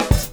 FILL, Grooves Filz Hitz

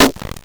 grind, EMU XL-7

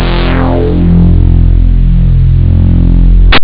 Reso Bass; jazz jackrabbit 2; M8

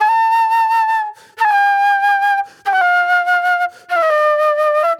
Flute

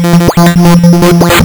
lsdj waves; wavetables

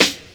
Snare, Ensoniq ASR-X

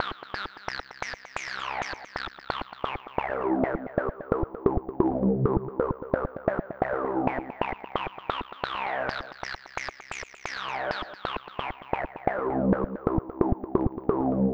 Acid Riff C, 132bpm; Big Fish Audio - Old Skool House; OSH Construction Kits; OSH Marshmellow

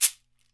CABASA, Steel Percussion; World Combos; E-MU Producer Series Vol. 7 – Old World Instruments

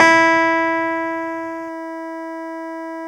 KEY X Hohner Clavinet

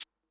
808 Maracas; Concrete Collage Drum Kit; Concrete-808

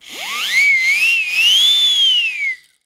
Wizz Whistle, cartoon Foley Fx; musicradar; cartoon caper

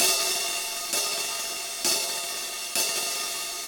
HAT, HI HAT LOOPS, Dub; Drumdrops In Dub VOL-1

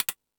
Tool Kit, Drum Machines E-MU Pro-Cussion

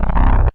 BLURP LOW, Hard & Loud Techno

